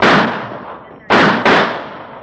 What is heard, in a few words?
gun shot; Crash Bang Boom